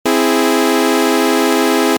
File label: C Maj Aug